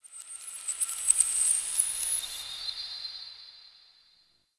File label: Zariman Metal Whispers; Warframe; The New War